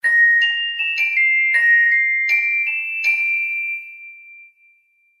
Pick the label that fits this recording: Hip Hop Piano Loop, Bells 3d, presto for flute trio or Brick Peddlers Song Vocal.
Bells 3d